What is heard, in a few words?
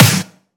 small reverb acoustic snare c key